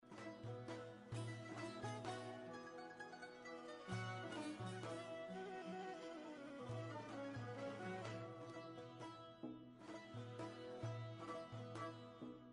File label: iran bgm